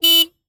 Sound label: Motorcycle Horn; Blastwave FX